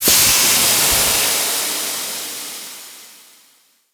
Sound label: Ship Decontamination Steam Burst B; Gameplay; Ambience; Warframe; Corpus Ship Remaster